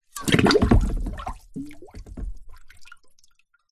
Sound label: foley metal pole section drop sink 2ft water plastic tub thud